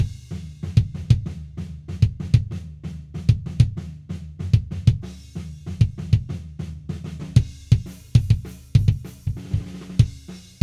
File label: KICK ONLY NO EQ